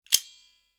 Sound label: multi plier open; mechanical